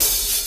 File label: open hat sound g key; Duration 0:01; Samplerate 44100 Hz; open hat samples